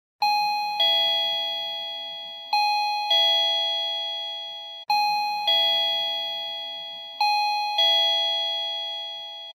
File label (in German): klingelton; Intertechno IT-7000 Funk-Gong-Set selbstlernend